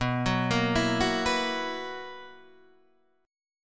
B Major 7th Suspended 4th B

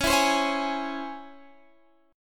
Db Minor Major 7th Db

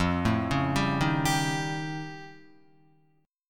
F Augmented 9th F Guitar, chord; arpeggiated

